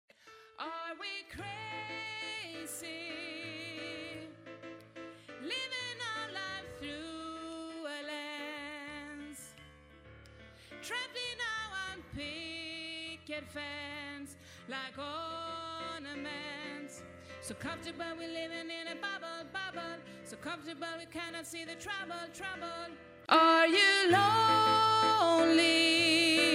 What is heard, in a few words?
tenor